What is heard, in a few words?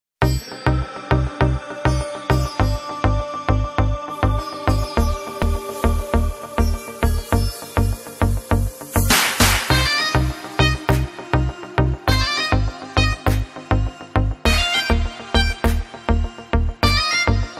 music ringtone